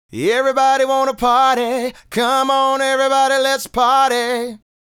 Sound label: Male Solo, Hip-Hop and RnB Vocals